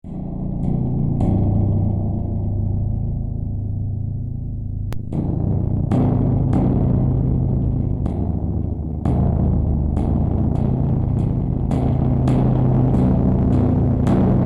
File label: metal